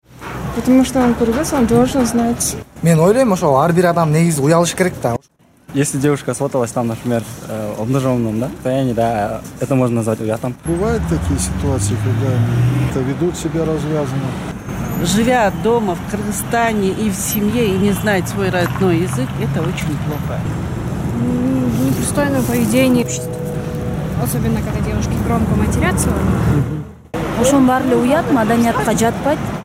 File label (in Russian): shum